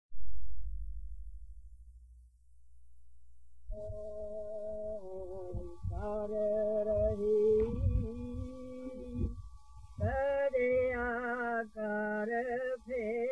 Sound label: wind noise; Potter Valley CA; Sant Ji's Satsangs; 1977 Tour; Shamaz Retreat